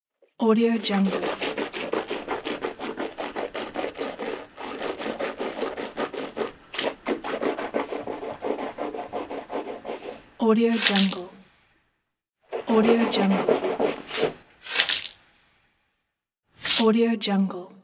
mouthwash and spit

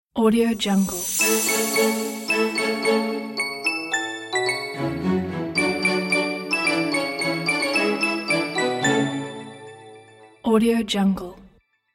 jingle bells logo; logocastle

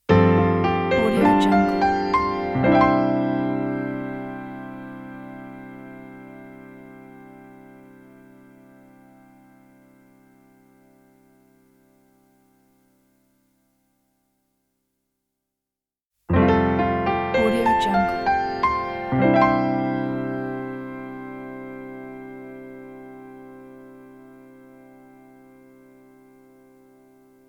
positive simple piano logo

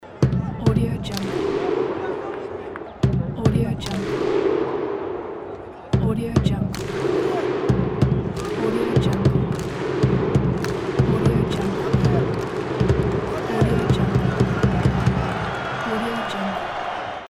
viking thunder clap

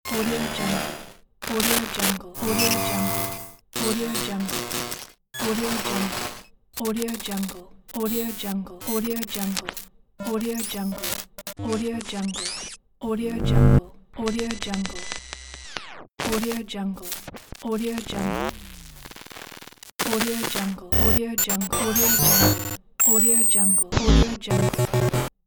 glitch sound effects pack

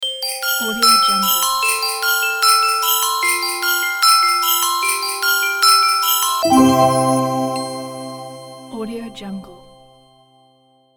christmas sleigh bell logo

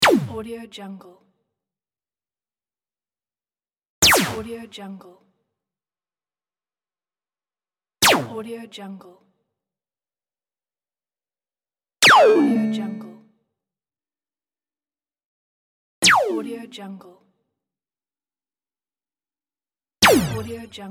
laser gun plasma fx pack

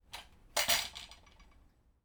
crossbow set down slide on linoleum floor

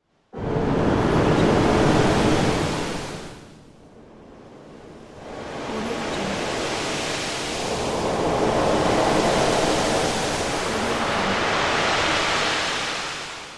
ship in rough sea; cinemandre

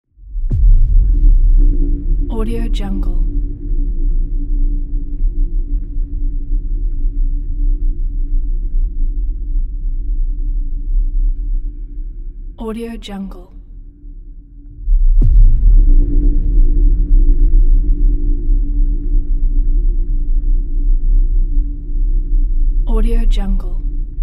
ethereal drone

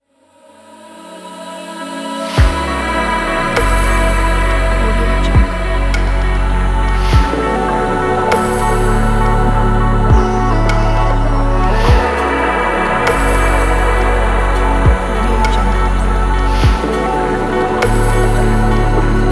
atmospheric ident

